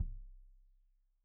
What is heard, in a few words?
Kik Stereo; Deeply Sampled Drums